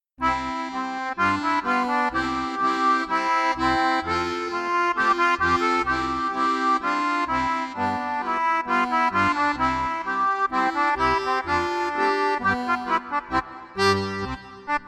Cowboy Tango Duet

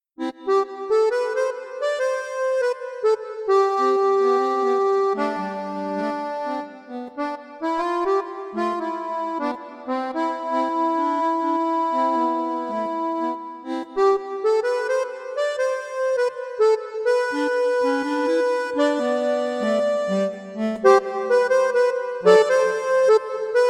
Summer Bossa Nova

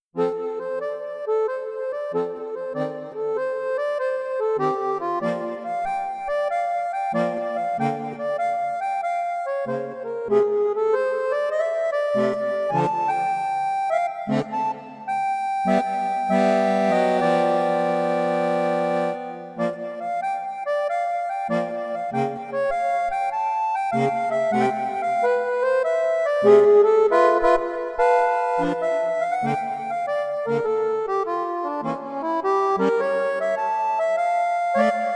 Sunday Blues

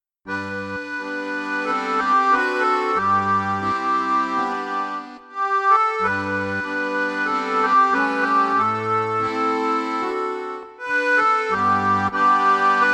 Western Song Duet

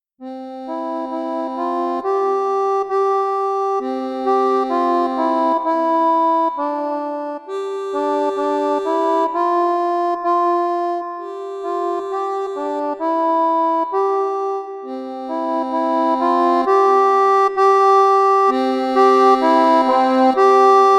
Country Song

